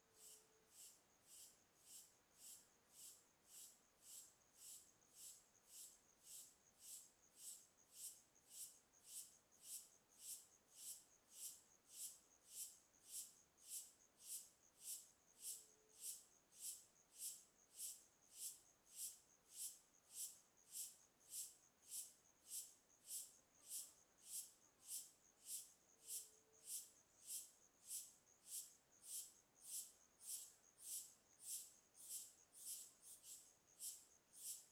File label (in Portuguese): Grilos e cigarras ritmicas; Cerrado; insetos; colecao sonora do cerrado